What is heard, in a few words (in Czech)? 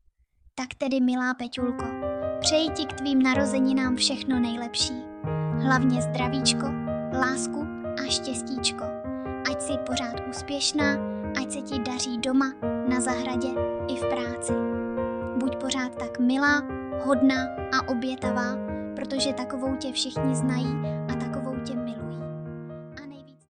klavir